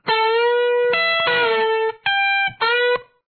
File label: lead, guitar